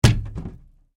Cardboard box hit

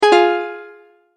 multimedia tone error

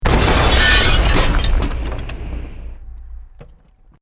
EXPLOSION MEDIUM BRICK WOOD GLASS NO FIRE; Created explosion effects, house, building or vehicle effects; Bomb

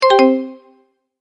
multimedia tone, Goodbye; Sound Design; McKinney Sound

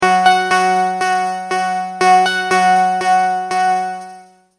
Multimedia Tone-Thinking/Processing; Tones; Sound Design; Multimedia Tone-Thinking/Processing 37; McKinney Sound

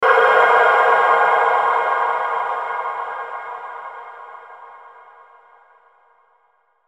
Hit Burst Airy Shimmer; Production Element Imaging Element Accent Transition

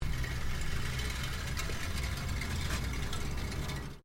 TOASTER POPUP ELEMENTS COOLING; Various kitchen appliances; Kitchen (Sound Effects:Household)

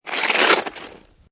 Snow And Ice Ice Slide Pass By; Weather

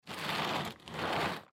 Toy Chest Slide; Miscellaneous Cartoon; Foley; Blastwave

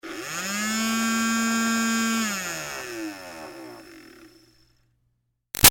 Toy Bubble Machine Med Rev; Miscellaneous Toy; Toys; BigRoomSound

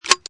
Load, Gun Handling; VRSonic; Guns

